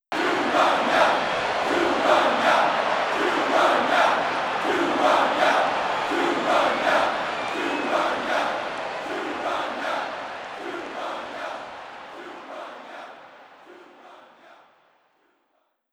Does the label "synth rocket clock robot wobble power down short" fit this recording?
No